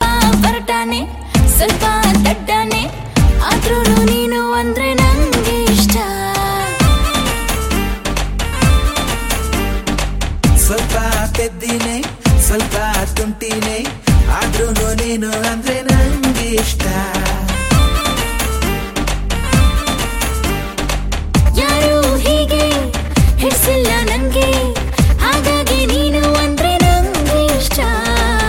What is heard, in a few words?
Kannada Movie Song